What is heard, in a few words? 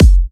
Kicks; Vengeance Samples